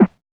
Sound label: Drums; Distored Reality